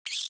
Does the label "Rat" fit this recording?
Yes